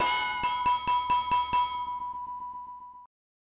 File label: Number One Answer Jingle; Family Feud; SNES